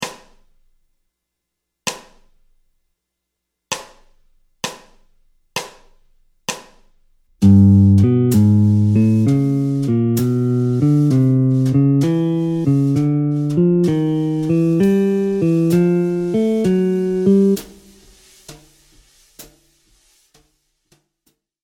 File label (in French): Gamme bop asc Pos 62 G Lyd b7, guitare jazz; boper ses gammes position 62